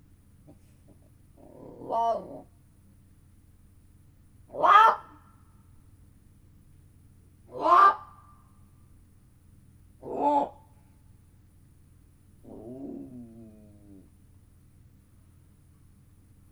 öt kiáltás, mocsári hiúz; miskolci zoo 2009

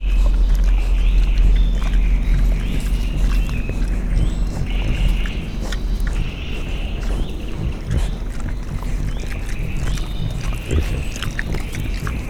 esznek a pekarik, orvos pekari, allathangok, zoo

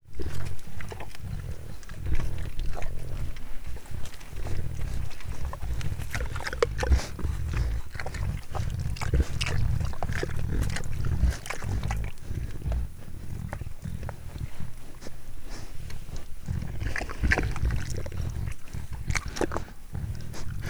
esznek, orvos pékari; miskolci zoo 2009

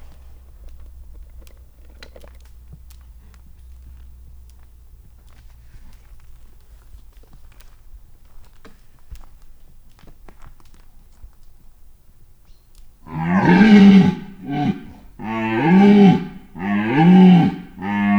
gimszarvas lepked, allathangok, zoo; budakeszi zoo 2010

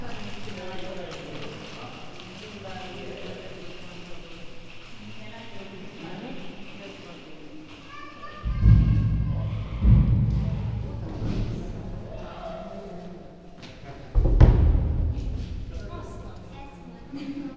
dongeto csimpanz; majomhaz; veszpremi zoo 2013

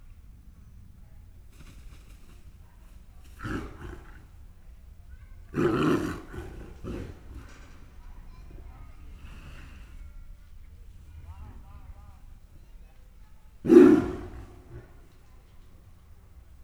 kifutoban kialtas, bengalitigris; miskolci zoo 2009

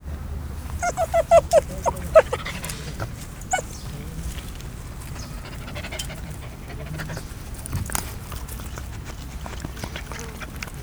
kicsit nyugodtabban, aranysakál

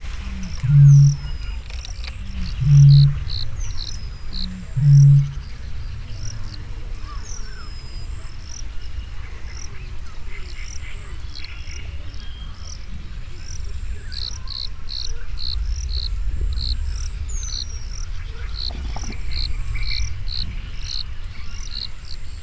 máraz elején háromszor, bölömbika; hortobagyi nemzeti park 2013

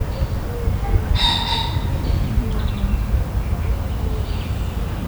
fácán távolról

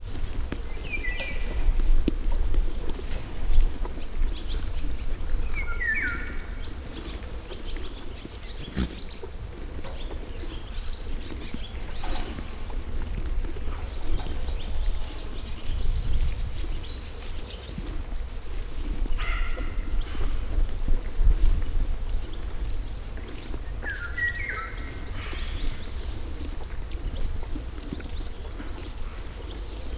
fűvettep állatok egyikük prüszköl, póniló; felsolajos zoo 2015; cardioid

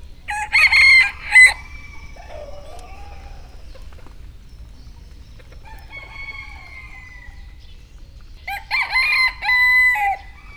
kakas; nyiregyhazi zoo 2010

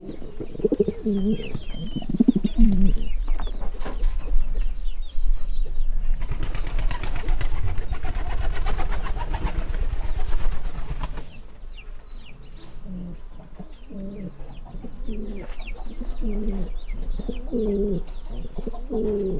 galamb duc fecske baromfi; parasztudvar

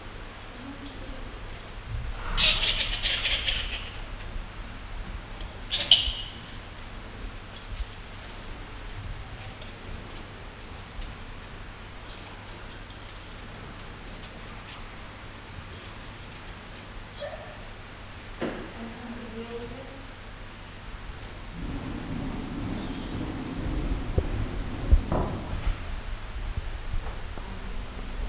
indiai repülőkutya; nyiregyhazi zoo 2010

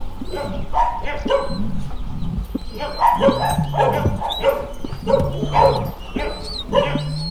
galambfióka kutya; parasztudvar

